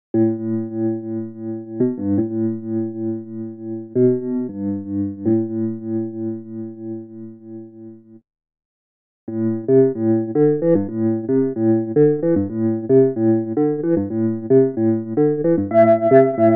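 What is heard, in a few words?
Groovy Electric Piano mono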